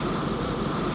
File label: jet light; space kitty